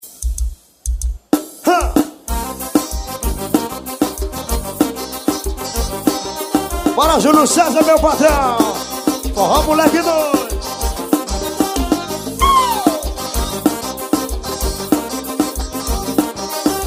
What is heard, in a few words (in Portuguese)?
forró